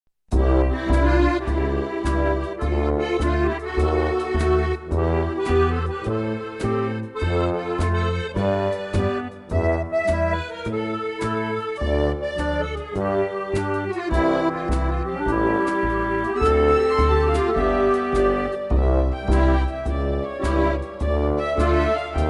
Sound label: Squeeze Box; Kid Pix 3 Deluxe; windows gamerip 2000